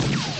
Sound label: shotgun